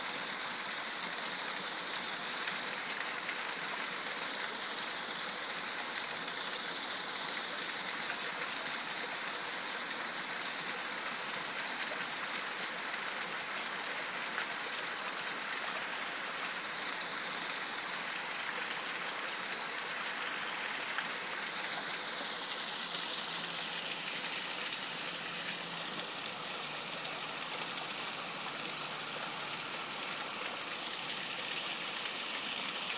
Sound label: small waterfall